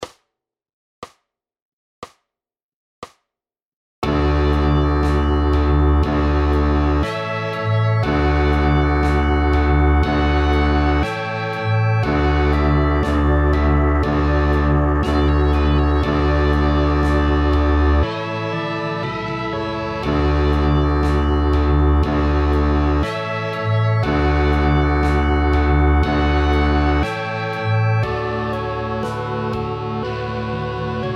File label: first song kontrabass